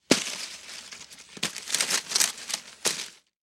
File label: Foley Straw Basket Fill